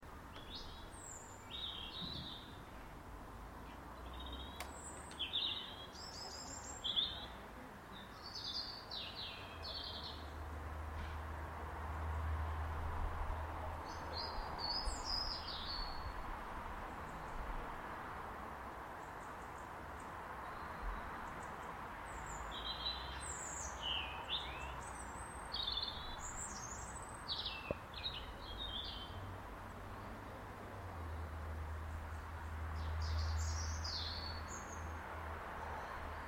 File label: christmas day birds in parents garden at dusk including robin blackbird crows; magpie